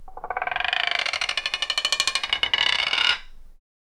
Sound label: a large wooden wheel of, game show